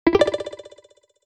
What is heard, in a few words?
gesture, message tones